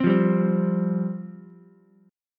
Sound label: G 7th Suspended 4th G down Ukulele; G7sus4 strummed